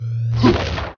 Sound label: charge special; Motumbo